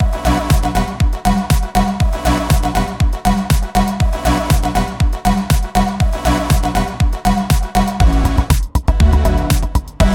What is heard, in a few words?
Music Loops; Ready to go Loops